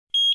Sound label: vending beep info; Original TK Sounds